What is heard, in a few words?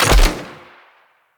Bow Shot; Sinecure